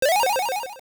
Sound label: Launched; Link launched by a warp portal